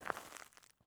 footstep trainers gravel compact walk slow; Phasmophobia

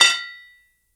impact metal cling deep damped; Phasmophobia

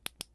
Flashlight off; Phasmophobia

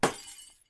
Bulb smash; Phasmophobia

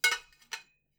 metal pipe; Phasmophobia

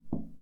Window Knocking; Phasmophobia

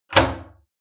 Door key lock; Phasmophobia

